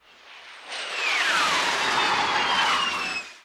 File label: MIG Sweetener; Aircraft